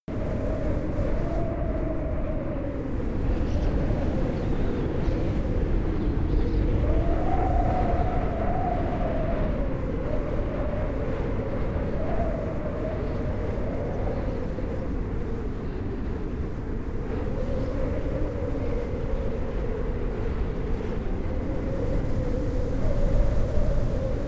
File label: generic wind loop strong